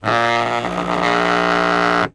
Technology Electronic Cell Phone Vibrate On Wood Bar